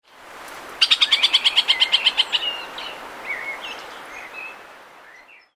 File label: middle spotted woodpecker